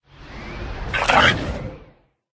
buyaka buff, pets; buyaka 3

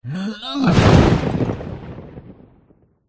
chaos titan attack voice bottom

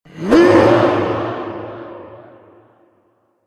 angel attack voice middle

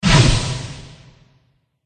boss attack contact up; eldive

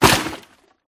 forest keeper attack contact up

